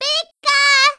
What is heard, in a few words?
Pika Shout Hello; pets